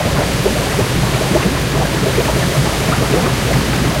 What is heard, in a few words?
engine water int rpm 33, engines tanks; War Thunder